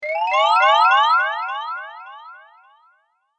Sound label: Rpg Magical Spell Time Jump; Magic Sound Effects